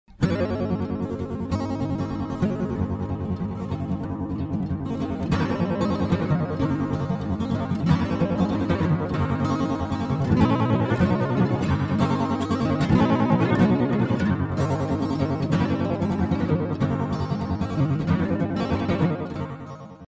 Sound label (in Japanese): モジュレーター 三角形